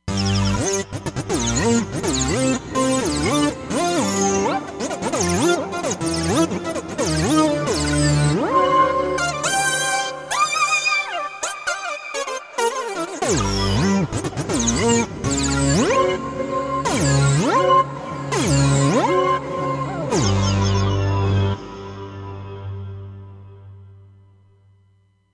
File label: Roland Fantom XR; Simply Nasty